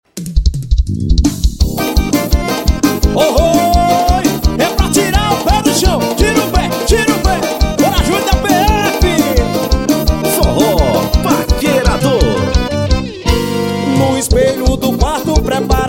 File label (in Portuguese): forro eletrico